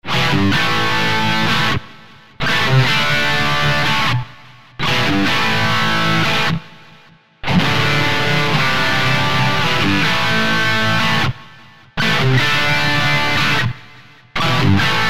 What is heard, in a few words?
GUITAR